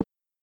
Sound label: Button Clicking Single